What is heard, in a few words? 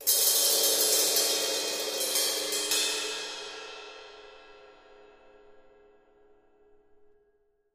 Cymbal Trill